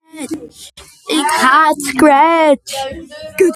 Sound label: scratch